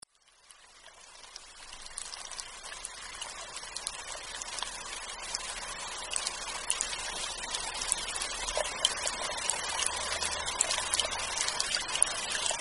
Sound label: fountains sound; your roman vacation